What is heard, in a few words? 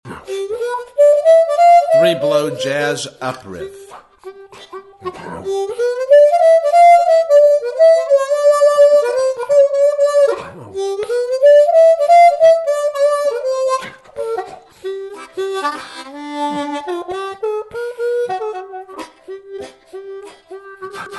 3 Blow Jazz Up Riff, harmonica